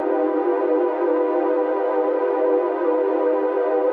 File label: 122 BPM Pads Amaj Gmin Tonic G, ambient synth pads; MMB